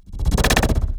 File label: Break Mono Jolt